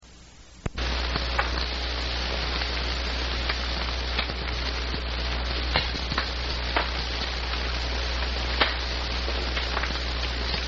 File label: message tape wild